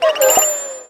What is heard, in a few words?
Mail; MonsterHunter